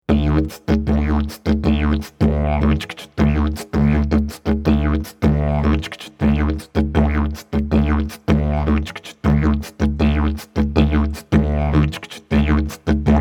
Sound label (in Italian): ritmo livello avanzato